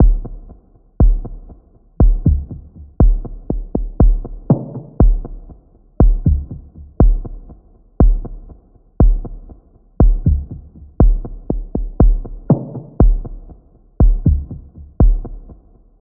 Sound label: Percussion